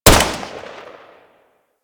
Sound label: Hyena Bullet Gun Fire, Enemies; Warframe